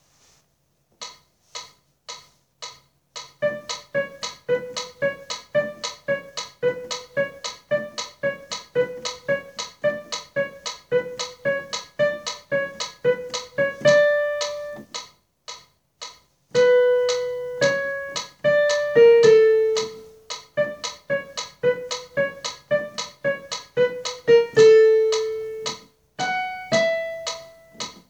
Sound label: Tenor, Accompaniments